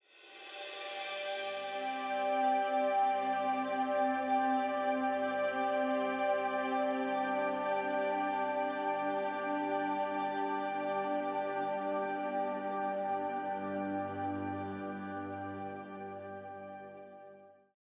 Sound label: ending Music; fantasy strike